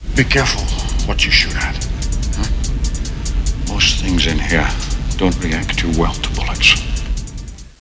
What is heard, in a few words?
sub bullets